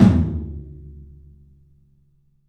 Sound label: TOM, Low Toms, 3D Percussives